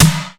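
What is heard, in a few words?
snare drum big; RY30DRUMS; East West; Global Sounds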